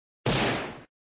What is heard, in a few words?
Sound Gun sound; Raven Bar